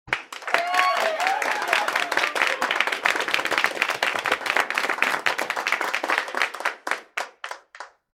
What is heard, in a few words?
victory